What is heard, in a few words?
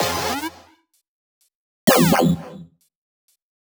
Melody Kits 128BPM Root F; Vengeance Samples